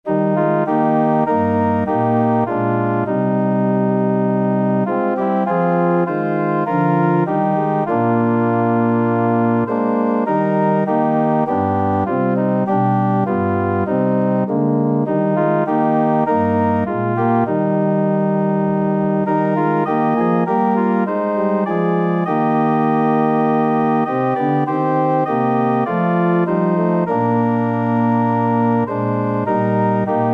organ

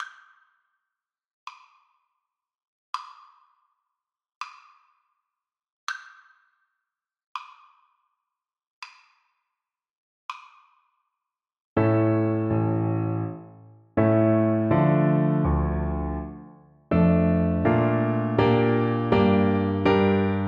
Oboe

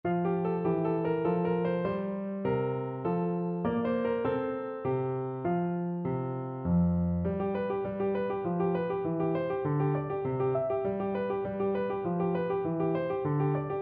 minuet in f major, piano

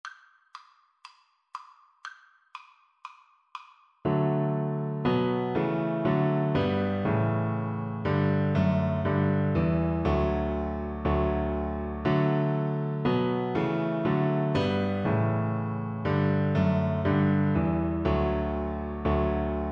good king, Double Bass

